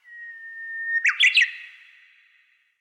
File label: Bush warbler, ringtones; Fujitsu F-02J